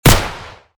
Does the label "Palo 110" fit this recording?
No